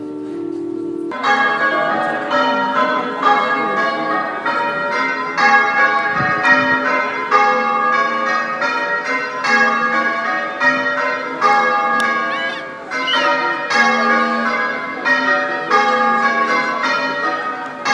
wedding bells

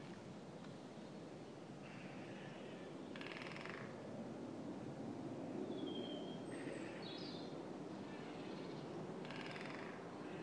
woodpecker; peckham

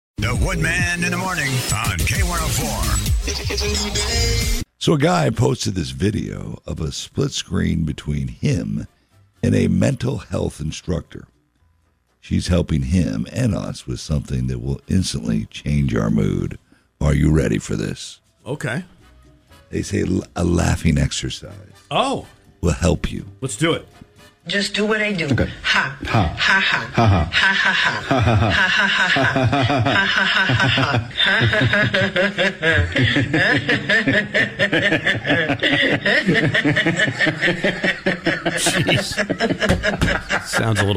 laughs so hard

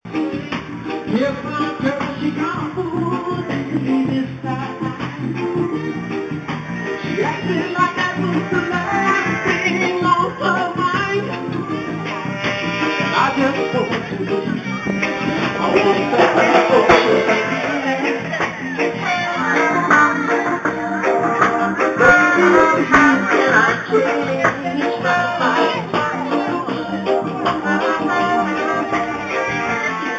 reggae music on the plaza

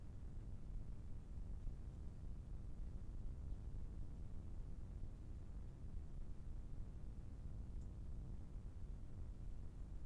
Neumann TLM 193 noise

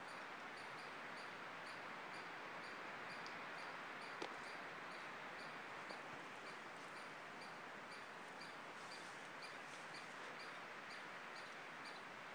animal in distress lighthouse park

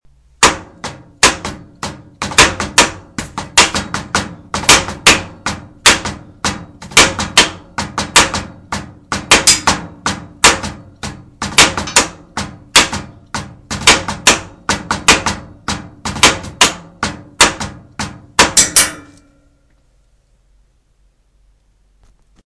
metal perc

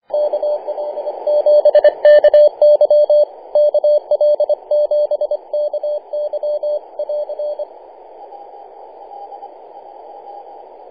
50 085mhz cw; kl7ky

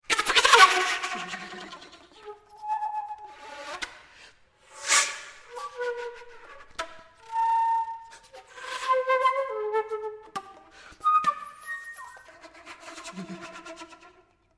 solo flute

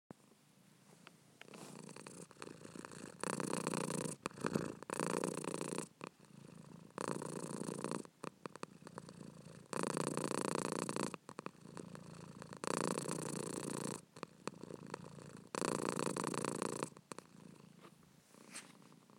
purring

